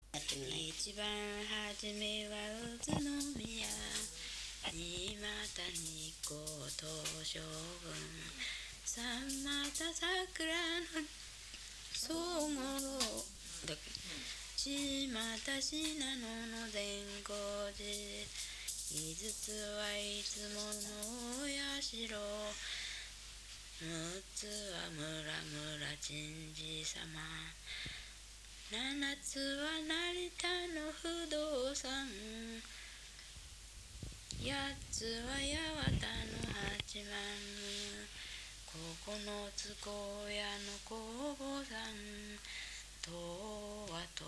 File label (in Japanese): itibanhajime, minyou; 一番はじめ（別）; 酒々井町